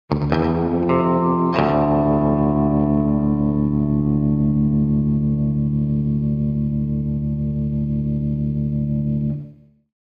Electric Guitar Twang - Texas Melody, (Slide Guitar); Hollywood Edge; sound designer's toolkit v2